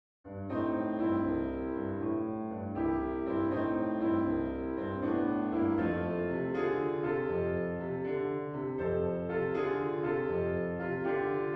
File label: saloon piano